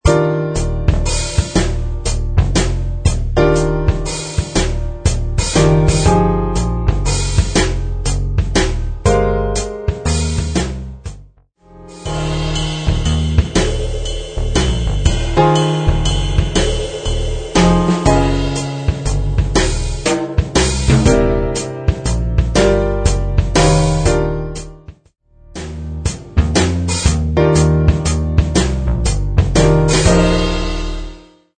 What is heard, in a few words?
120 BPM Noir 30s Jazz